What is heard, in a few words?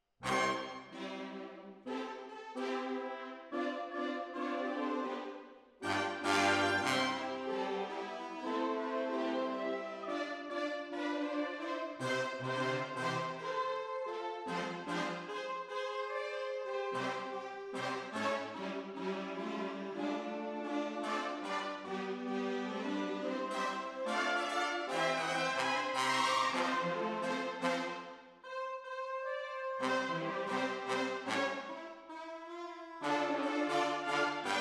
Hall, Jazz for piano